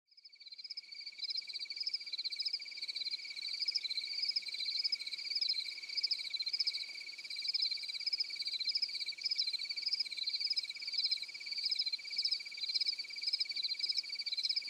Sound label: cricket warm night; ambient